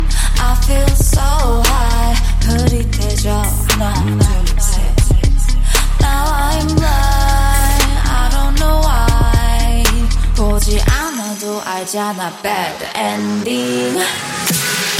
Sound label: Noir Break